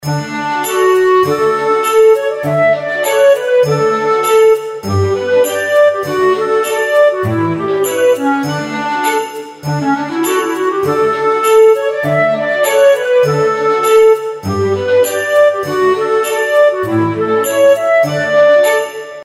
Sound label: nutcracker outro bgm; The Nut Cracker